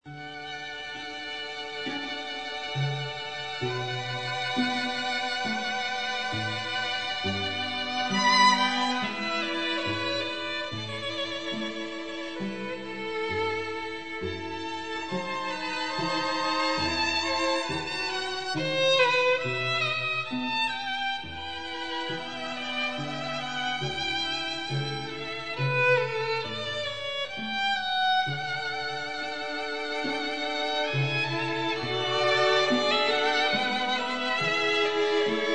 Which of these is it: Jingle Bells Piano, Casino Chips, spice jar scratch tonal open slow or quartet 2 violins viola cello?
quartet 2 violins viola cello